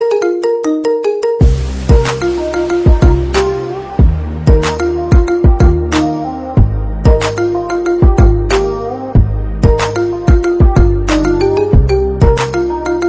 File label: marimba